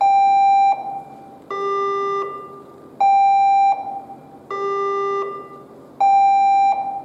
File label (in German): feuerwehr alarm; hamburg